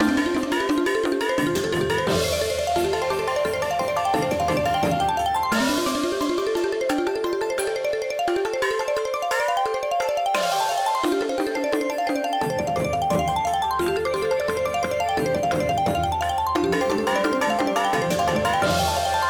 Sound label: big Win Loop; netent; twinhappiness